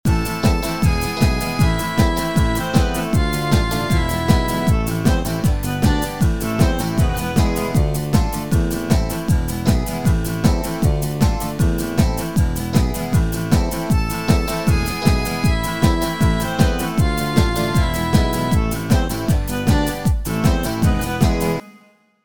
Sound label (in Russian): chastushki fonogramma; muzyka dlya chastushek